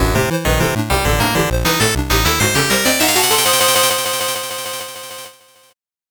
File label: 8bit jingle slow